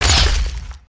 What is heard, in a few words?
Fish Crossbow Fire; weapons; aronwk collection; ndaudio